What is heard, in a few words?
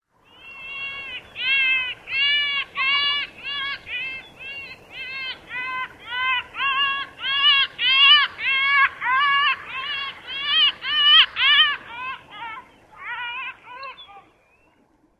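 calls, pomarine jaeger; AK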